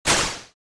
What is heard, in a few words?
Text letter reveal intro anime